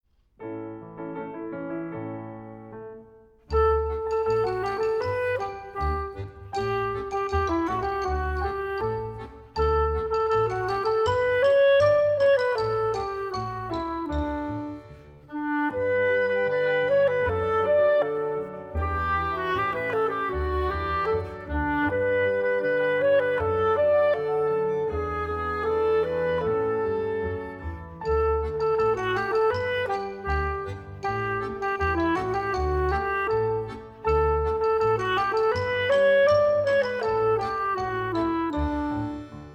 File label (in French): clarinette